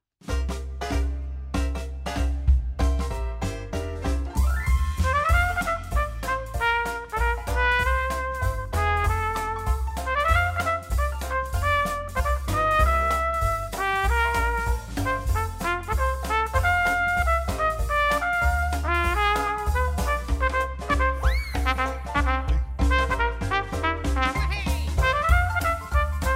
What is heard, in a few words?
dixieland style, trompet; Sonobus; online trompetles